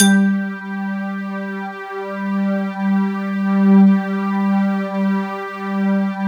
PERCP, PERC PAD; Sound Gallery - Charged

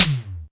E-TOM; Back In Time Records; Big Bang

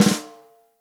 ROCK SNARE CHROMATIC, Real Drum Kits

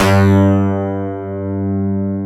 SUPER SYNT; Spector

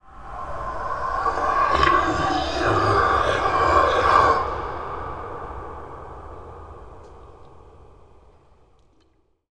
Howling, Strange Ambiences; Sonic Foundry (Sony Creative Software); The Electronic Music Manuscript Collection

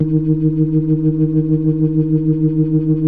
EB3F, B3, Vintage Keyboards

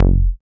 short bass; Dance; BASS SOUNDS; Masterbits; Dance Trax

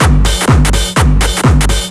DRM 125, House

